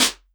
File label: Elektron Monomachine, Drum Machines